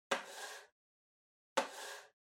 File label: PC-Mouse-Move (Far-Wood-Hit)